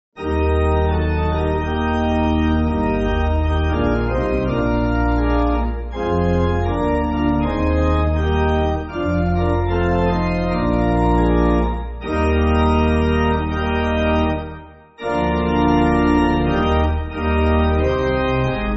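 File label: Pipe, Music Backing Files for Churches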